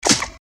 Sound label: automatic injection